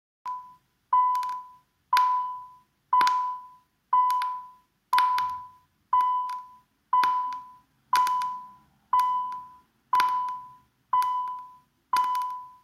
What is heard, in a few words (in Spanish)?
sonido reproducido dell